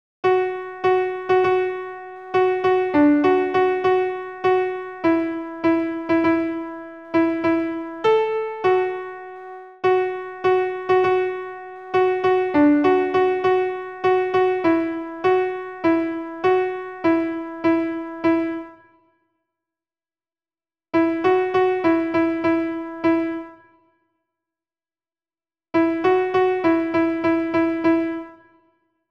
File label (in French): alt pian seul